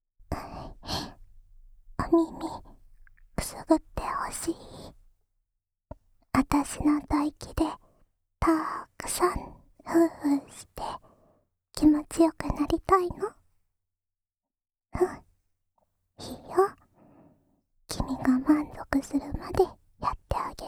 安眠用お耳ふーふーループ 右耳, ASMR; 禁断の魔女

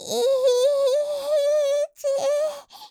喘ぎ声カウント; カスタマイズ用音源

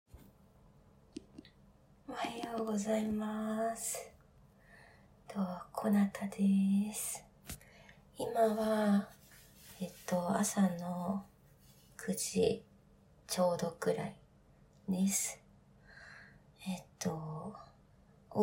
おしっこ実演 トイレで朝一のおしっこ

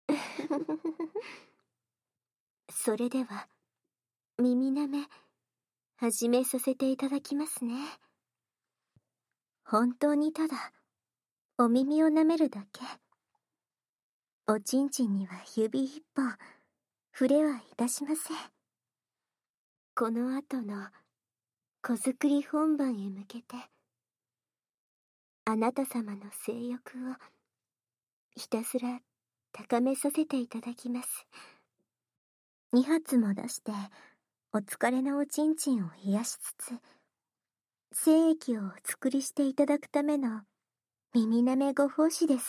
焦らし囁き耳舐め; 淫語; 双子巫女さんのあまあまご奉仕～子宝神社の小作り神事～

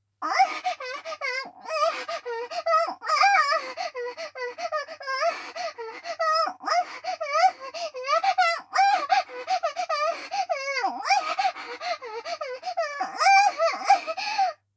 喘ぎ（強め）; 性欲旺盛な陸上部の先輩後輩JK、ベロチュー青春溺愛えっちでコーチの汚ち◯ぽ生ハメ特訓♪

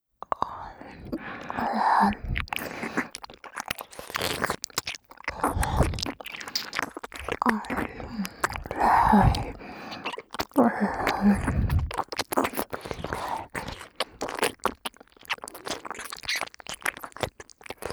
サキュバス姉妹のW耳舐めループトラック, ASMR; ダウナーオホ声WサキュバスJKと激狭ワンルームの生活音垂れ流しだらだらえっち同棲

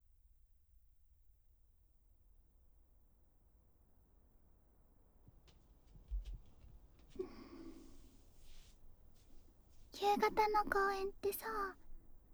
公園のベンチで耳舐め＆パイズリフェラ; 萌声ひなのちゃんと学園無声音こそこそエッチ

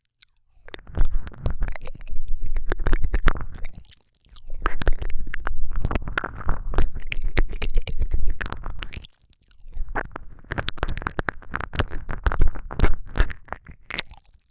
ぐっぽり奥まで耳舐め, ASMR; 【超耳舐め特化】夜に癒されるたっぷり耳舐め【安眠ASMR】

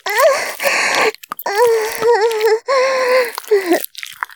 絶頂 中出し

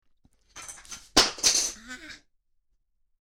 アーーッ; NG集; とろあま双子ナースの包茎回診～あま～い双子ナースに、入院生活で欲求不満の包茎チ〇ポをとろっとろにしてもらいました～

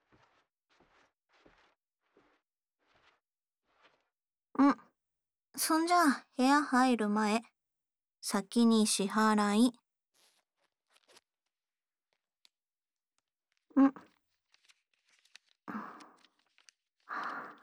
乳揉み ＆ 手マン

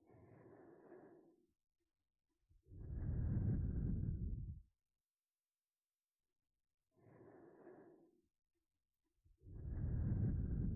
寝息ループ, ASMR; KU100バイノーラル; ヤりまん銀髪ギャル警官

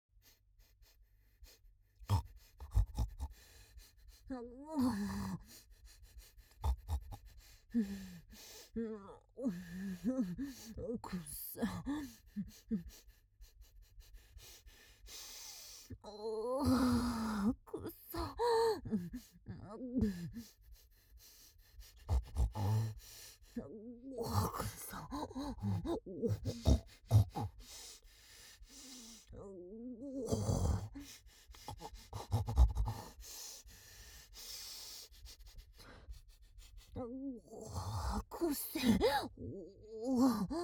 発情豚嗅ぎ; 痴女系お姉さん

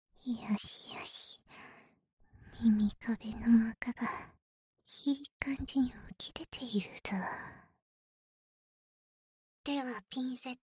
【左】ピンセット耳垢剥がし, 耳かき

